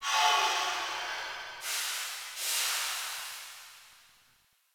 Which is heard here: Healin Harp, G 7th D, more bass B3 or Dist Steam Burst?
Dist Steam Burst